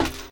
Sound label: metal step; Original TK Sounds